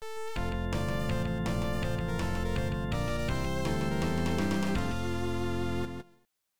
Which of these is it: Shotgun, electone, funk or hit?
electone